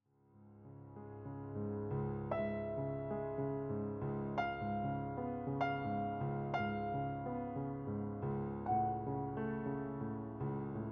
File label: Nocturnes In C Sharp Minor, piano; chopin nocturne selections vol 1